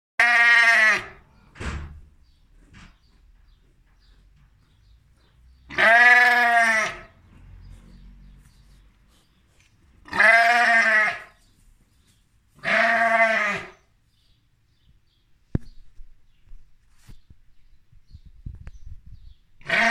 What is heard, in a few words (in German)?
Schafe Tierlaut; Modulkoffer